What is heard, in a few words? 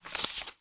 card squash